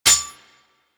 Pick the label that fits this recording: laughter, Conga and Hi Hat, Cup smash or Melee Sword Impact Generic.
Melee Sword Impact Generic